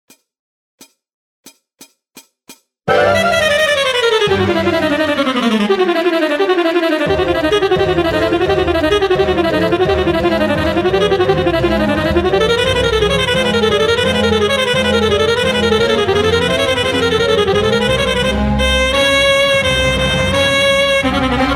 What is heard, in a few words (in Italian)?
Volo Del Calabrone Sax